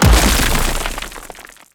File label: imp rock throw